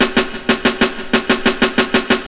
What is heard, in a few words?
Fills; AMG; Now CD-ROM (Roland)